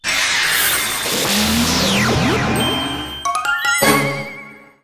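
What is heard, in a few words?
level up; SHINKA; Yokai Watch 1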